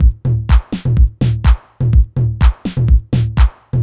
drum fixed stripped 125, Classic Chicago House Drum Loops